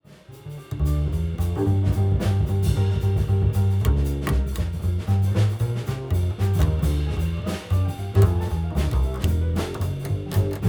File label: bass unprocessed